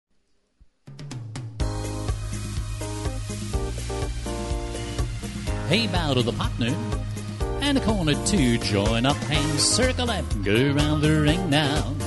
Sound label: hoedown vocal